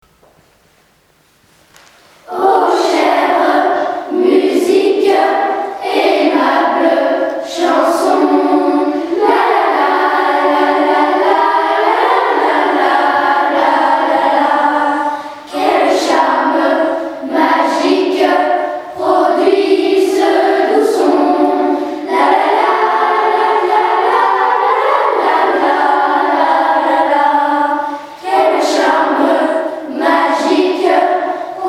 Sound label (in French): Choeur des clochettes